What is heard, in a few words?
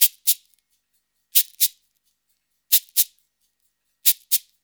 089 FREES, Modern Percussion Loops; USB Soundscan vol.56; AKAI